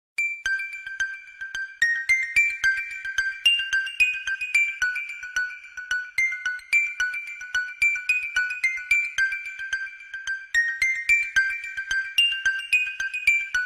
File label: cool ringtone